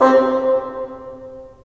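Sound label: wind bassoon; LA Composer vol. 3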